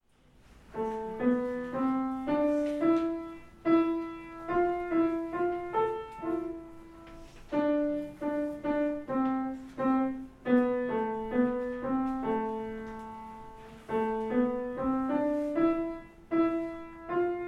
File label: 500-Year-Old Melody; based on a 16th Century Italian Melody, arr. by Faber & Faber